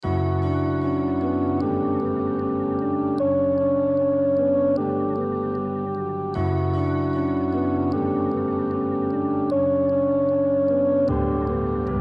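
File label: F minor 152 BPM Piano Keys Vocal Bell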